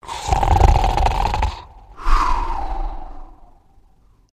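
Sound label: Character Giant Sleeping Snore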